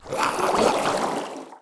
swamp

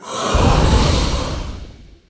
hex

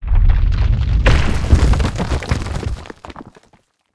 earthquake

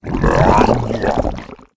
ooze

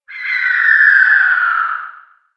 screech

